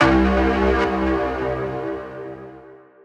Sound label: synth note wet pursuit C; Virtual Riot; Synth Notes & Chords